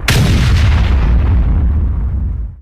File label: KABOOM; Artillery; EpicArtillerySounds